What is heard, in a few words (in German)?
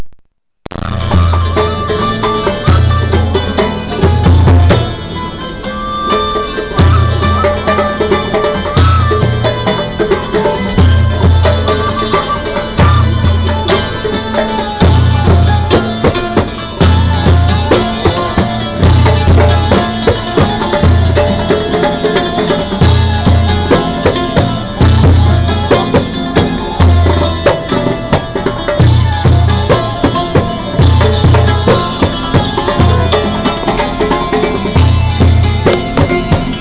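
Strassenmusik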